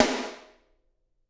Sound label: SD, Drums; Bob Clearmountain Drums I